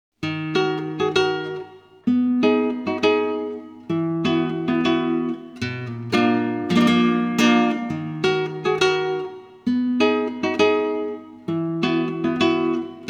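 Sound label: tientos, flamenco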